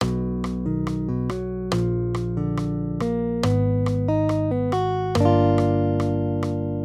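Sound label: Accompaniment, Major Triads, B-Bb, 140 BPM, guitar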